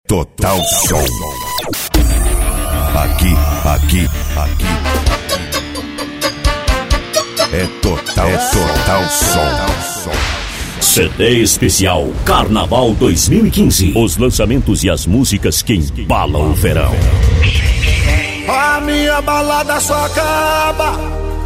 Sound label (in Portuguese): pagodao funk